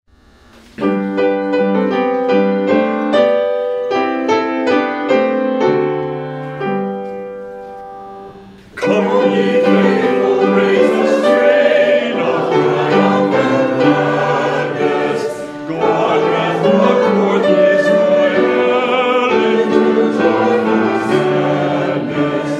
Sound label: hymn; Come, ye faithful, raise the strain